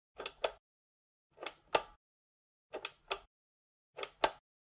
Generic Button Metal Small

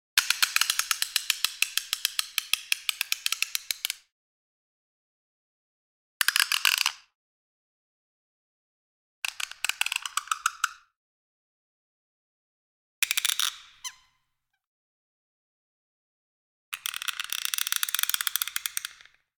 Thumb Screw Turns; Sound Ideas; Series 2000

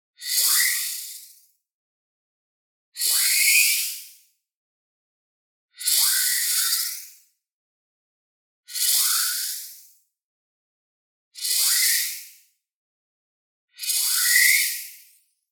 SHIMMER Metal Chain Delay Formant Shift; boom library; Anime Essentials; DSGNMisc

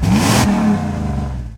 Truck Dakota Rev; Trucks

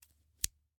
Scissors, snap, snip, shear, medium, single; Eiravaein Works; Vaeyan IV

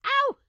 grandma short yell; Blind Drive

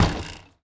Street Ball Glass Rim; SFX Kit; Street Basketball

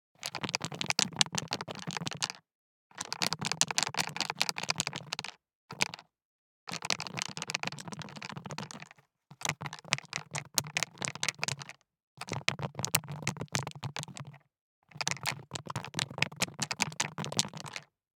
DICE in Leather Cup, Shake, Two Dice; Samples; ArticulatedSounds; DICE Sfx Party (Extended)

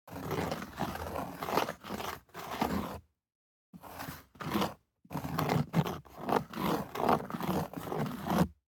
DICE on Neoprene, Slide, Standard, One Die; Samples; ArticulatedSounds; DICE Sfx Party (Extended)

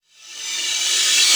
NOIS; XXL 800 Production Effects

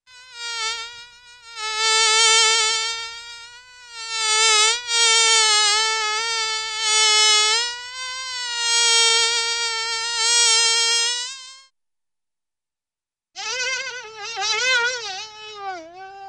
Fly Buzz, Cartoon; Hollywood Edge; Cartoon Trax

